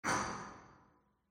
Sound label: warehouse brick drop; ambient, rocks